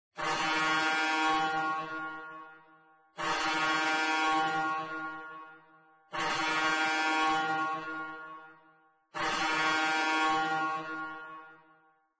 Combat Bgm End; MicroVolts Recharged

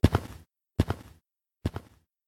Steps Left EXIT; Five Nights at Candys

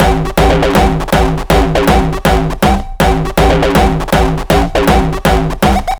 Beats Loop; Anger Foot Demo

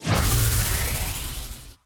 Energy Orb

